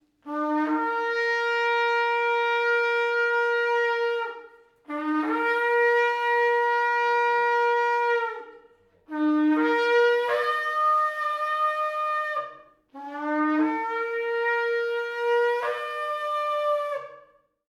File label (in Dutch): Sjofar